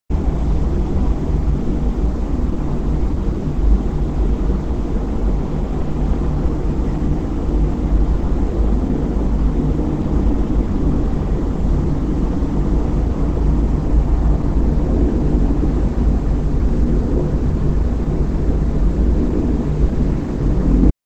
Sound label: room ambience; Milk outside a bag of milk... OST (2021)